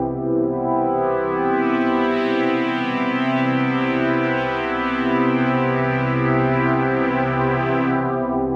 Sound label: 112 C Pad; New Age Ambient Loops; Spring